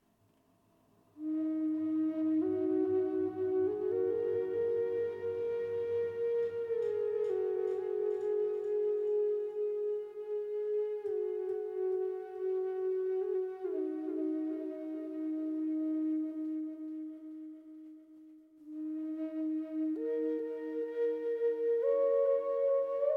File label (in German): E 432 Hz mit Echo, drone flute; ahorn